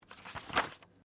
Book Page Turn

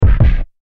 Musical Element Techno Hits Ascend; Miscellaneous Musical; Blastwave

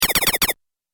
Quad Laser Shots; Laser Gun Sound Effects; Sci-Fi Laser Sound Effect

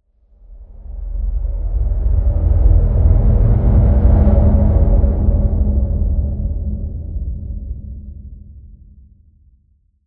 Storm Gods Transition Swipe, whoosh sound effect

